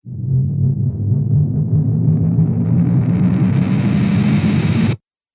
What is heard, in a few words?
Dance Riser